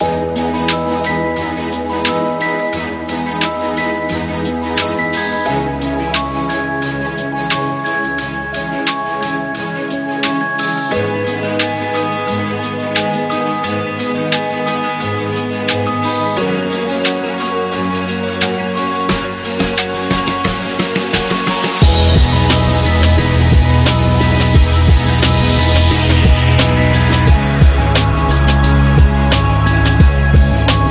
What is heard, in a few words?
Lounge Beat